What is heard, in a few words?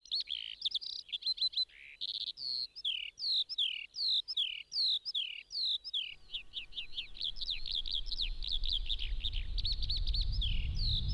Airplane